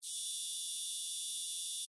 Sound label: Laser Beam Cut High, Pitch; Laser Sound Effects; BlastWave